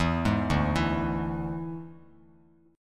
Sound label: D Suspended 2nd Flat 5th D Bass Standard; 12,11,0,9; arpeggiated